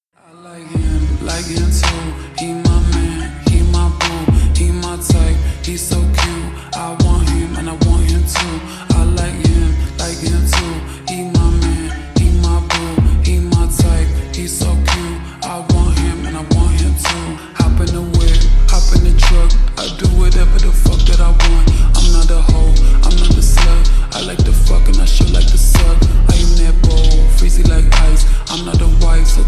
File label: slowed reverb